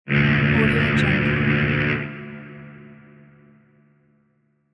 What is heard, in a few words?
ship horn sound